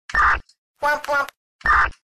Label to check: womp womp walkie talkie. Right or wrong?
Right